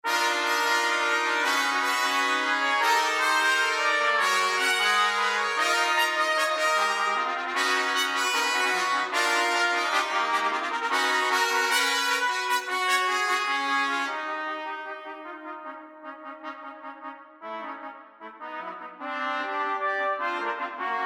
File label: Fanfare